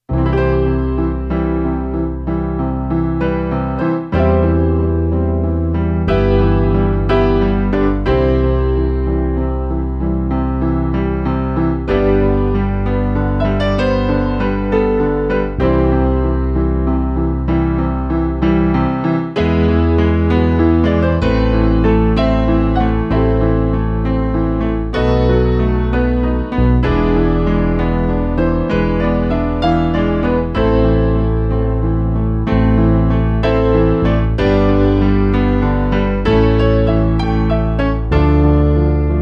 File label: ave maria piano